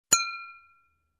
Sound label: Drinking Glass Hit